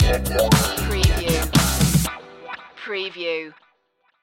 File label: daft funk stinger